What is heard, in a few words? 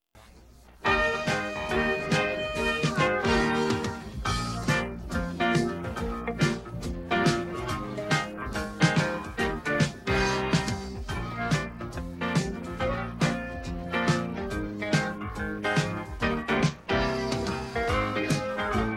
running shoes music